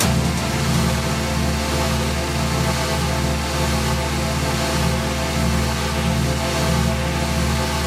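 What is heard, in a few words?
122 BPM Pads C major A minor Tonic D, ambient synth pads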